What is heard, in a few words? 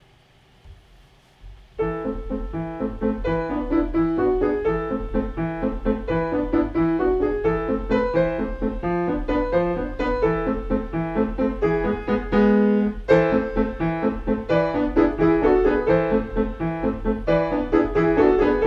Waltz Var